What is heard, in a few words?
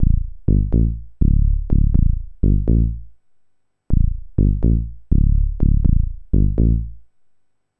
123 A Korg bass